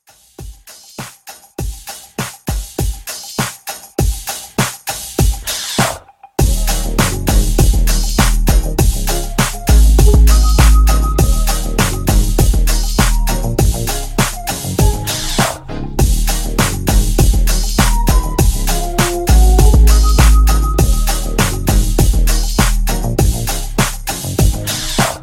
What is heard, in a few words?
Electrowave Mashup Re Drum 100